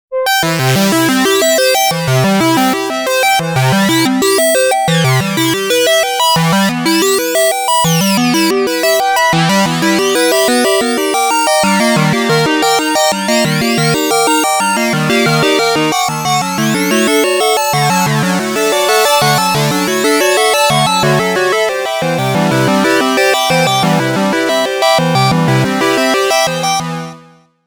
Sequential Pro 3 Vintage ARP eggio

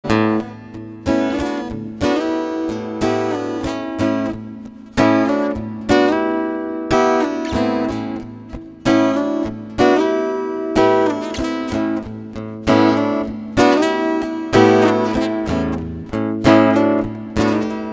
Laid back blues

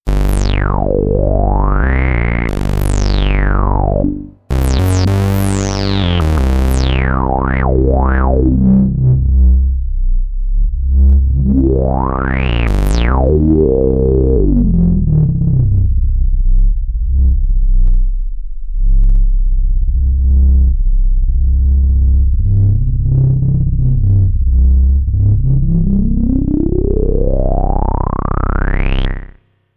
SH-1 Bass

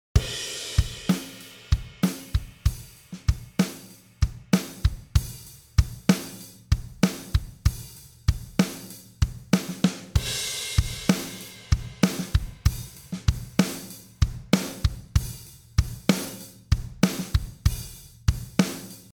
Drum ART SCL2 Manual Auto

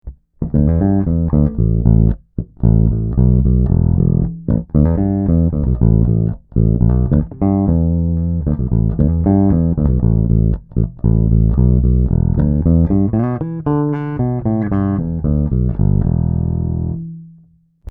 Harley Benton BZ 5000 Fingerstyle passive

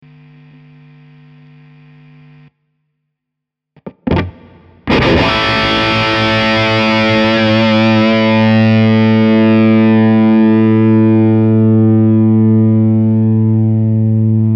Engl Fireball 25 Noise Gate

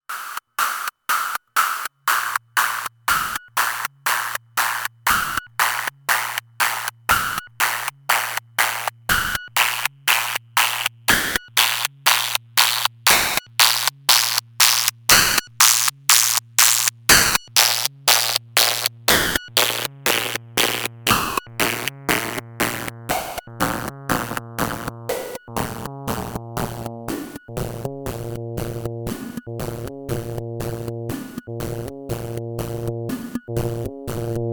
twin VC Filter BP Filter Filter FM

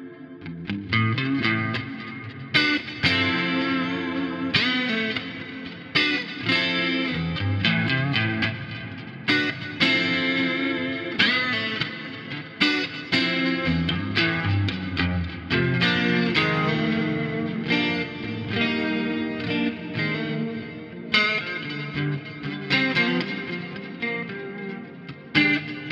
LA Studio 80 DI; Two Notes Audio Genome

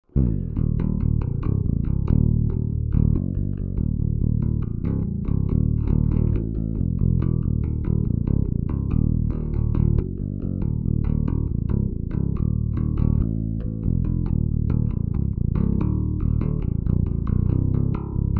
EBS DI SKC Fingerstyle Low Feature

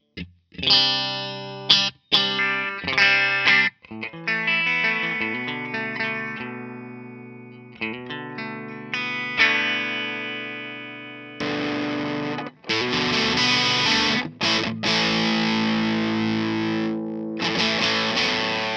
Strat Twisted plus Buff on off

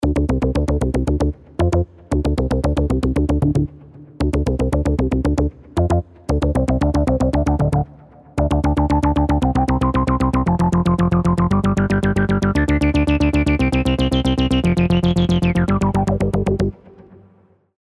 Arturia V Collection Mini V4 robot bass sequenz

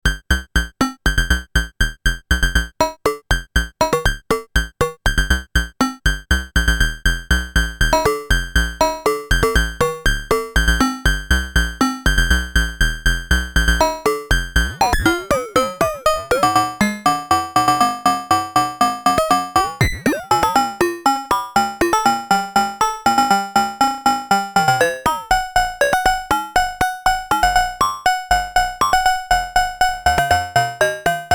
Synthesizers LFO FM Dry

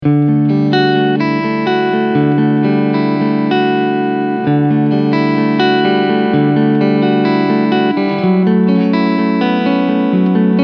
Cleansound Front HB SC Mode

